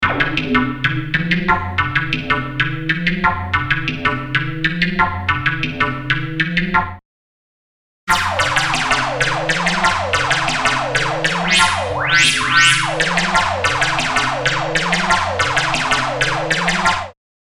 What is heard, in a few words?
Moog Modular